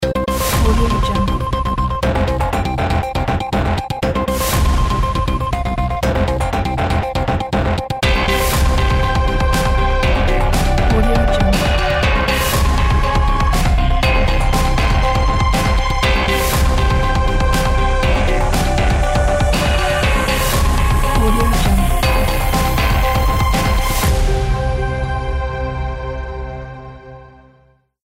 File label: cinematic powerful dark ident